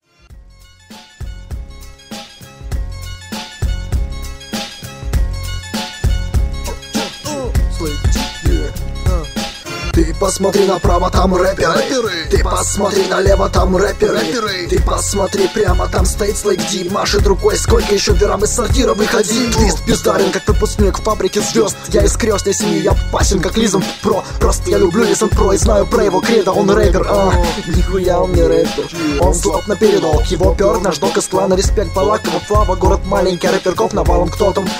drum

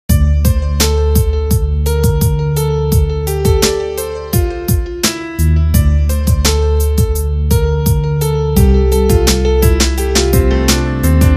instrumental

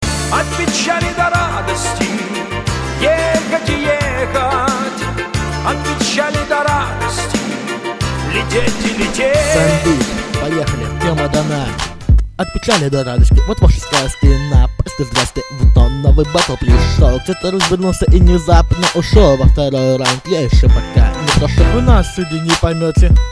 rb sambeat

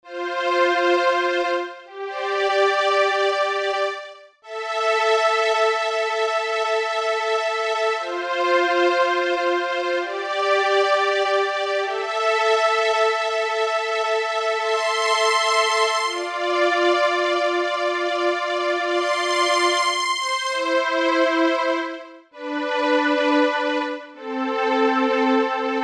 string solo

